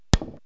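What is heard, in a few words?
stroke, 9ball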